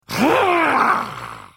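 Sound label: Attack, Khajiit female; The Elder Scrolls III - Morrowind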